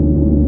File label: machine; de area51; cstrike